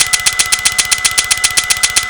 chaingun empty; tf2

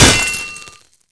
gold wrench hit world; tf2

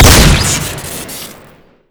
blunderbuss shoot; weapons; tf2; crit

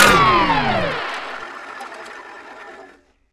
circle saw wind down; tf2

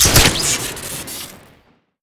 sticky jumper shoot crit; tf2

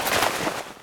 weapon inspect movement; tf2

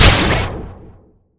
radio gun shoot; tf2

